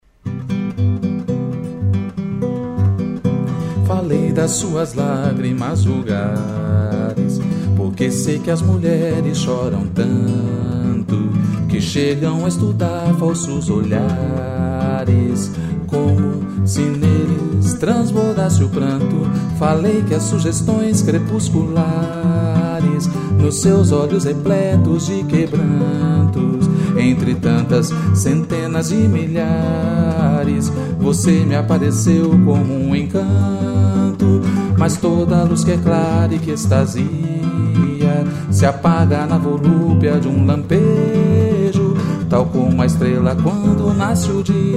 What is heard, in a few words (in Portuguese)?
voz violão